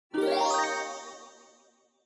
Multi Accent Mid

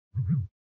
Cell Phone Vibration (Blanket)

